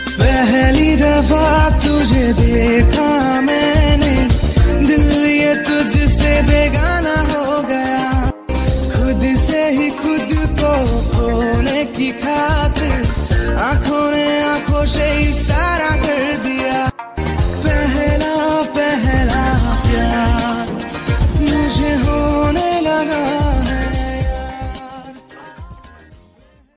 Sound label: punjabi lovly ringtone